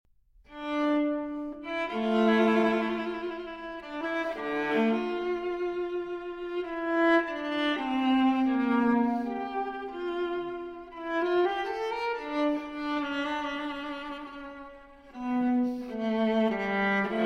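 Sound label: Cello Suite No. 2 in D Minor Transcription For Viola Sarabande; J.S. Bach; BWV 1008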